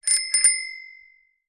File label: vehicles bicycle bell ring lever; zapsplat